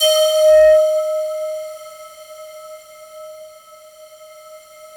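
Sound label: FOG ATTACK D#2 Right, Yamaha VP-1; Music Store; 90's Sample CDs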